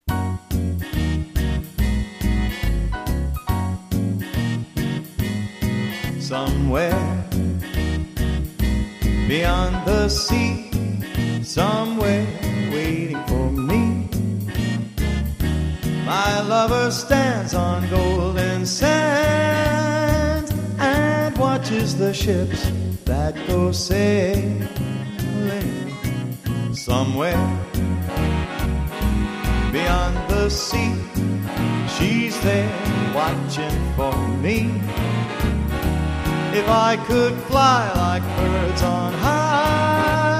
Party Band

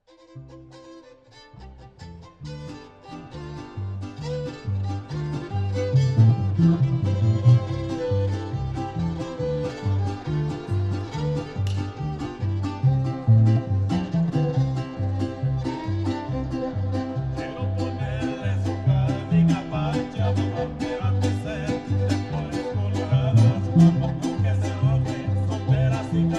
Mariachi; Minneapolis

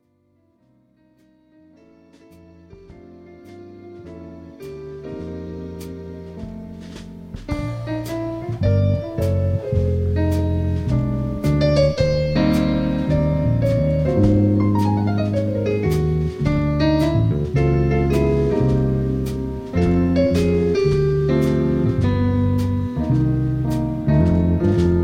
Jazz Band

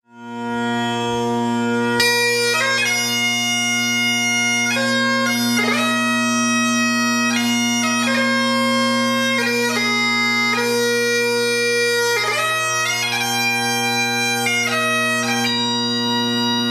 Bagpiper; Charleston; This Charleston Bagpiper began playing the bagpipes at The Citadel, The Military College of South Carolina

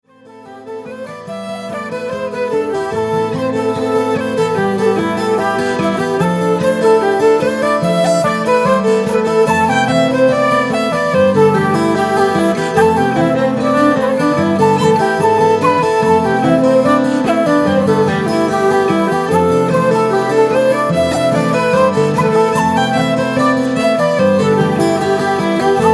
Irish Band; Castle Kelly

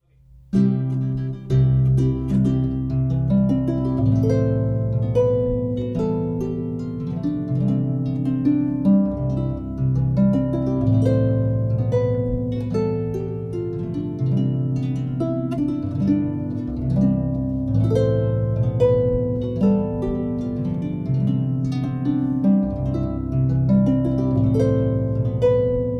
Harpist, Wedding March; San Francisco

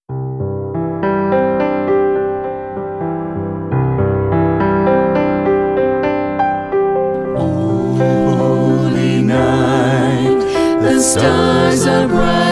Carolers; Sacramento; Sacramento Carolers 1; These Sacramento Carolers are comprised of ten members. All sing beautifully

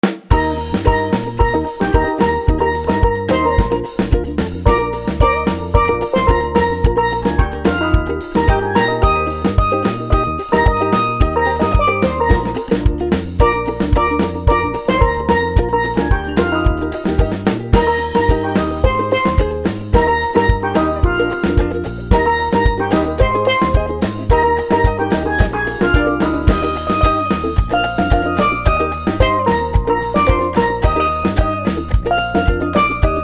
Caribbean Band

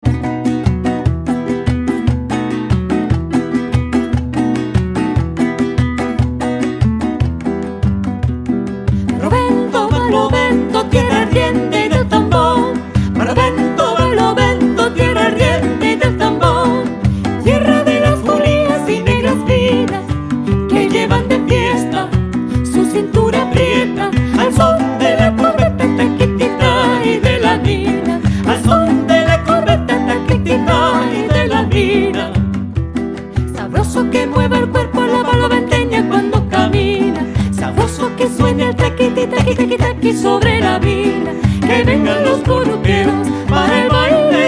Latin Ensemble

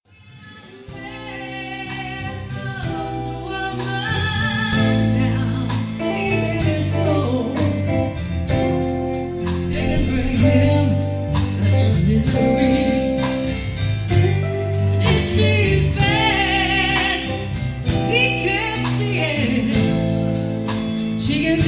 wedding band; New Orleans

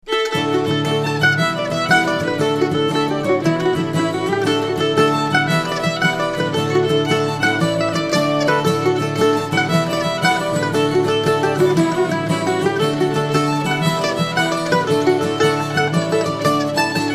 Irish Band; Lansing; Lansing Irish Band 1; This Lansing Irish Band brings together guitars, mandolin, fiddle

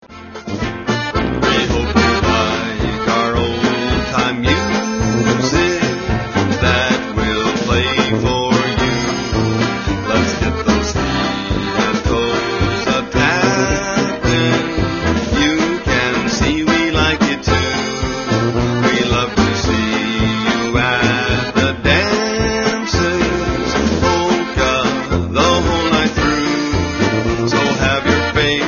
Polka Band Old Time; Omaha Polka Band

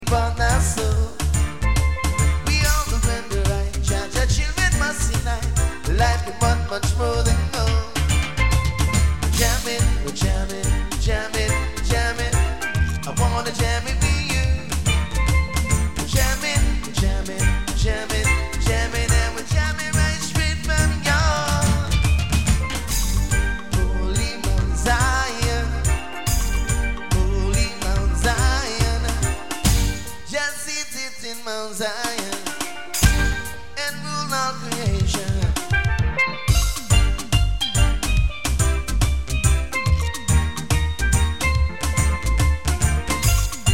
Caribbean Band; Orlando

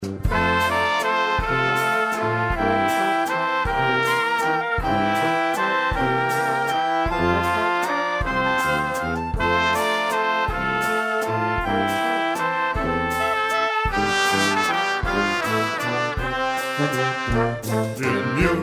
German Band; Chicago

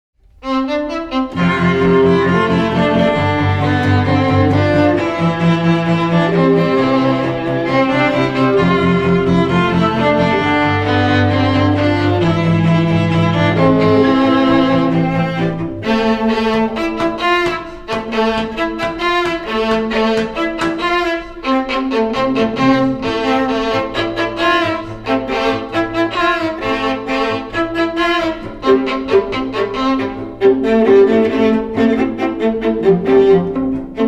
String Ensemble; Charleston; This Charleston String Ensemble is an international ensemble

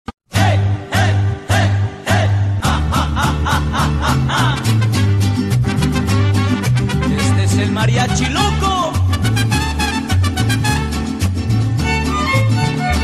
Mariachi

